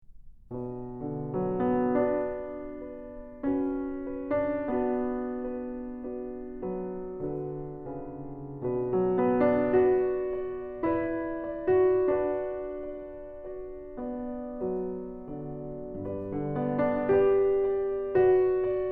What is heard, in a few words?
Prelude in B Minor; Magrath Book 08